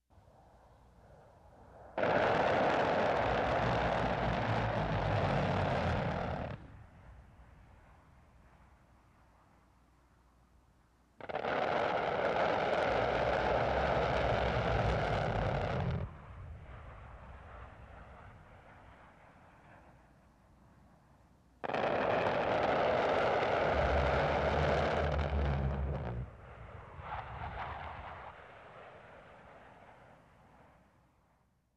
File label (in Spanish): ruidos